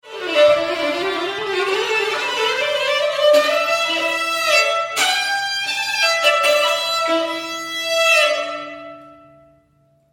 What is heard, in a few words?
gliss